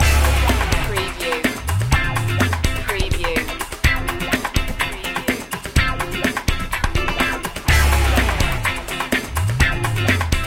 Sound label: funky cuba loop